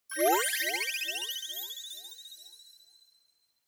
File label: Multi Accent Long